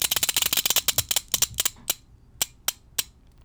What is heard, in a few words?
Ratchet Harsh; bb - Smol Mechanisms